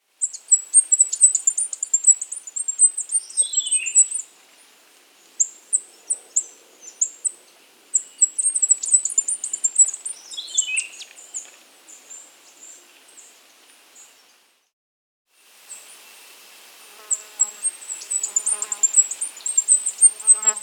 Deep-Blue Flower-Piercer (Diglossa Glauca), Andean Birds; S. America